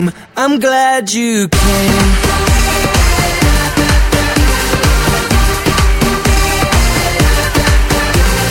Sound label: tecnohard